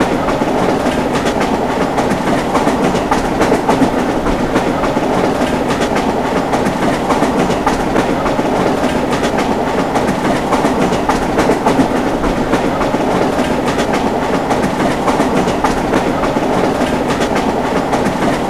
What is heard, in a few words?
tram cruise; sligwolf